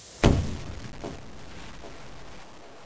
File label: dist m79